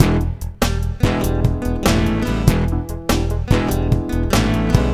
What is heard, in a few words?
POP-BLUES; GROOVES